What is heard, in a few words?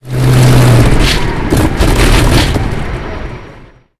engine griffon stop cockpit; War Thunder